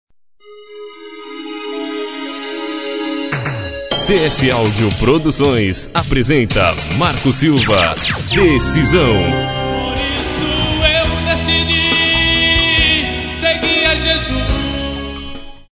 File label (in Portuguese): abertura, cantor